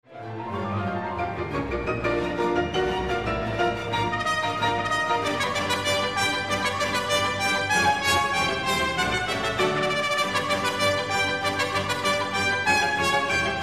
Shostakovich Piano Concerto 1

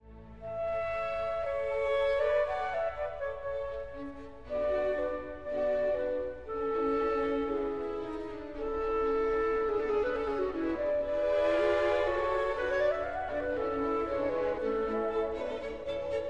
Clarinet Concerto in A Major K. 622 I. Allegro

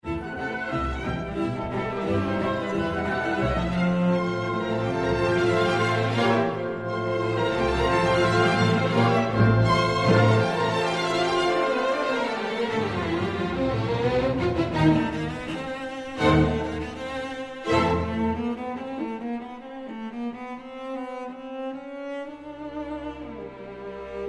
Saint Saens Cello Con 1; SAINT-SAËNS Cello Concerto No. 1

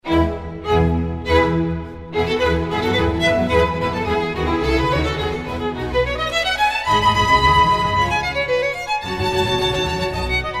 Bach Violin Concerto 2

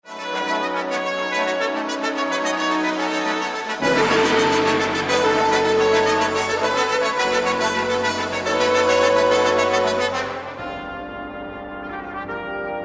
Tower Fanfare; Joan Tower Fanfare for the Uncommon Woman No. 1

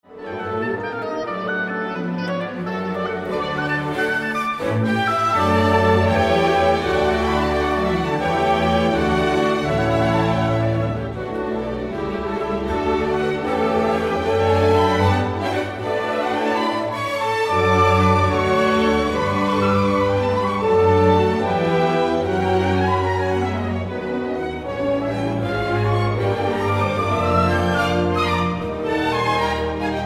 Oboe; R. Strauss Oboe Concerto

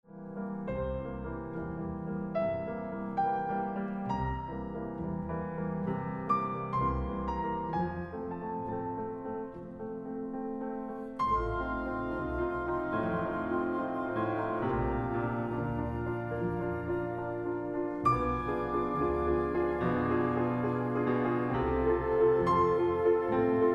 Mozart Piano Concerto 21

